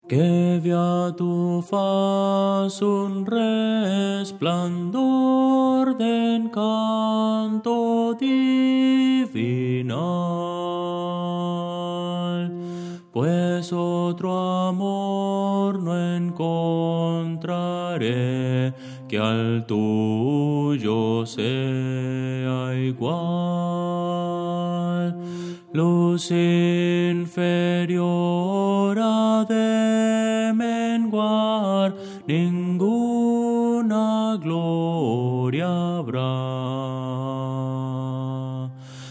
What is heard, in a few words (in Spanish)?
bajo, himnos cristianos; que vea tu faz